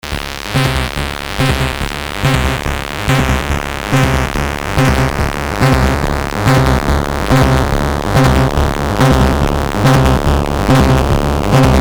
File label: TS303 vs MS20